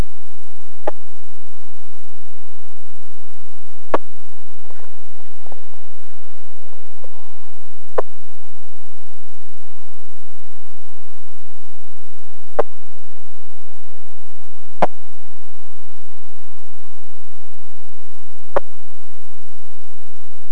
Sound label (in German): Insekt Mono